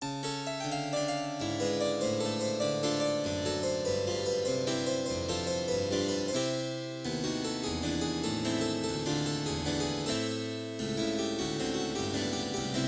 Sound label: Gloria impro